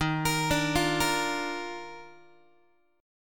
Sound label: D sharp Major 7th Suspended 2nd, Guitar; arpeggiated; JGuitar